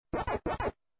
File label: waka waka; pac-man; task-acknowledge